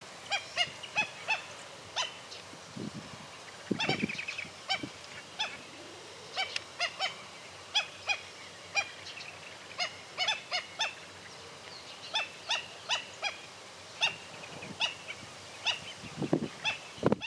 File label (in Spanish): Tero Real voz, aves